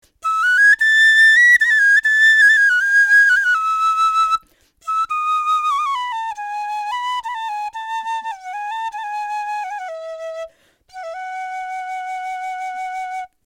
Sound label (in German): schilfquer e